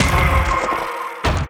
BLADE PAWN WAR DIE

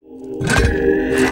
STONE KNIGHT WAR POWERUP

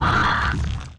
SANDWORM DAMAGE; MASS; Mob

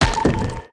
BLADE PAWN WAR DAMAGE; Mob

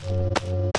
war walk

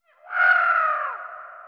eagle Bird, ambience

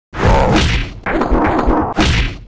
CRAWLER REX attack

